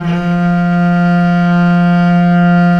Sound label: VL F2, VIOLE, Historical Instruments; USB Soundscan vol.23